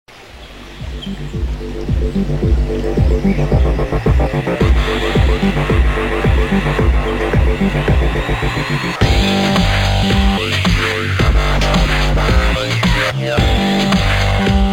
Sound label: Classic Lobby Theme; Pixel Gun 3D